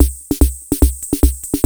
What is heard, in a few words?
TR-66 SHUFFLE; Crypton - DrumBase5000